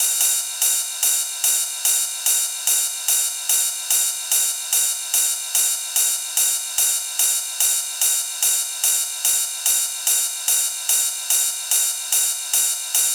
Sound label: Ride Loop 146BPM; groove techno